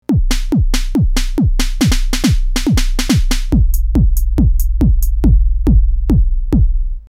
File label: Electribe Drums